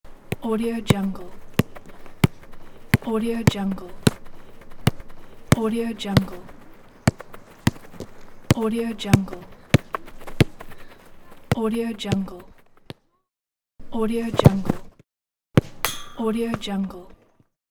soccer ball kick

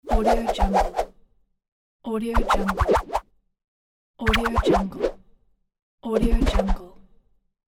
swirl

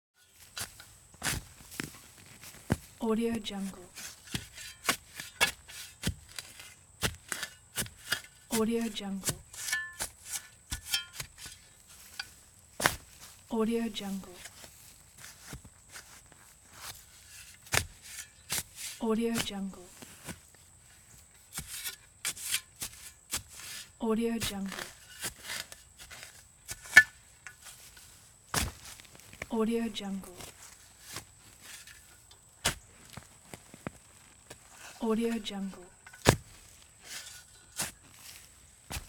shovel chopping digging

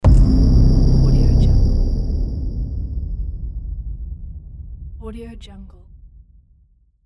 sub low boom

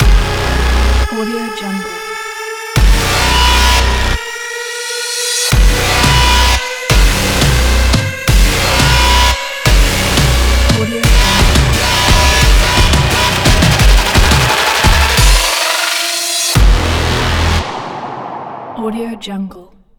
dubstep intro

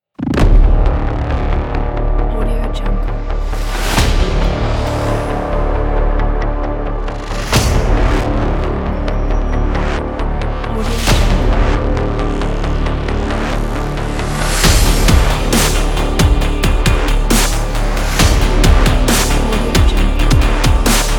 cinematic dubstep trailer ident

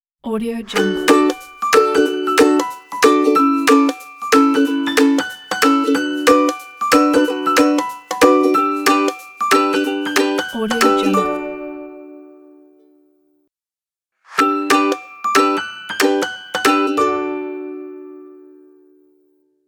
happy commercial intro logo

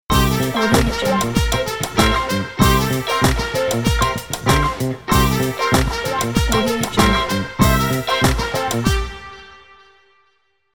funk logo

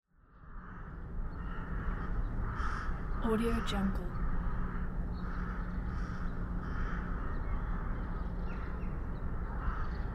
crows and ravens scream

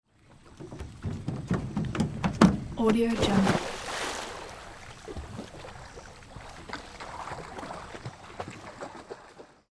jumping off dock and splashing into lake

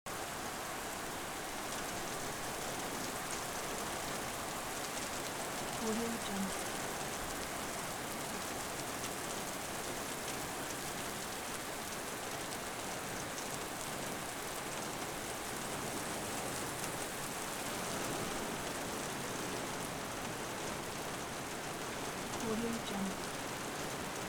rain; dedgestudio

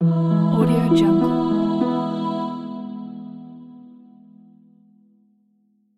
choir of angels

